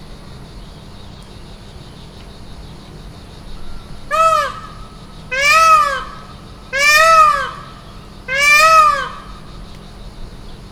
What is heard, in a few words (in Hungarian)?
egészen közelről, páva; makryammos beach; thassos